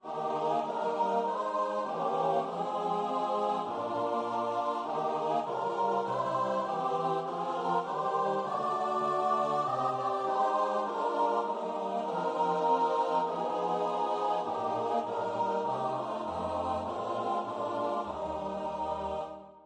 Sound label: CHOIR